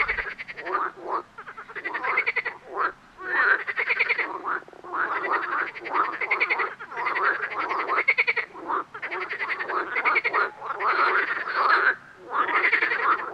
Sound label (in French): grenouille verte